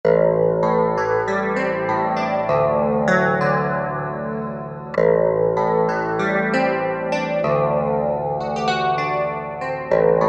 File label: Smooth Clav; PIANOS and KEYBOARDS; Apple GarageBand 2